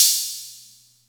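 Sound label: Atlantex Music Percussion Computer hi-hat open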